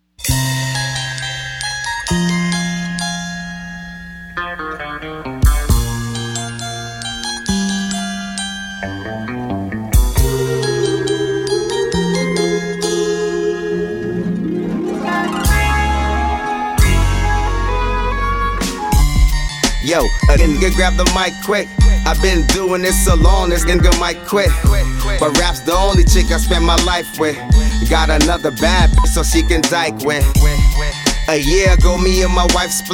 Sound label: Rap